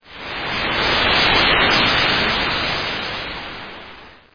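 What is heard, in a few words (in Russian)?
ветер короткий шумный приглушенный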